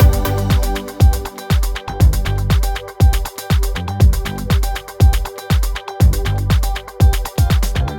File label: Wild music; netent